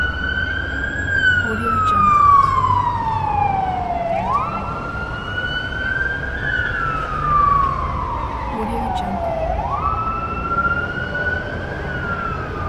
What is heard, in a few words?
traffic ambience with ambulance siren